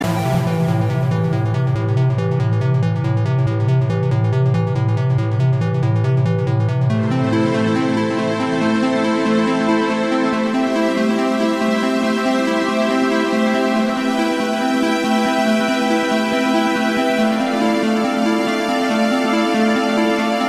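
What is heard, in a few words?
Music Sand Intro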